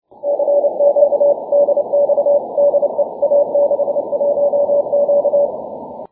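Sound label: 6m continuous wave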